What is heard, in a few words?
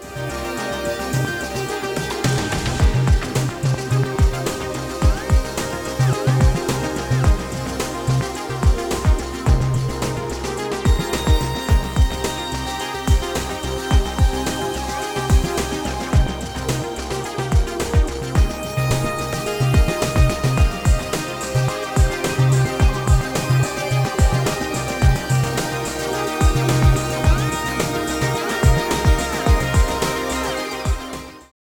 Electronic Track, Stereo Lo pass